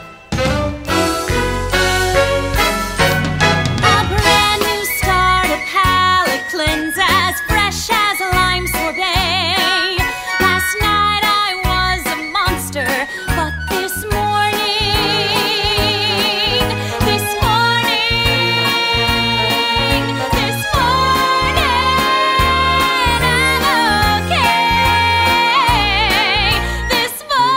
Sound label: Vocals; academy players audition materials